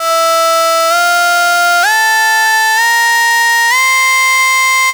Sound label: Synth Break, Cinematic Funk; Sonic Foundry (Sony Creative Software); Cinematic Funk Urban Tone Poems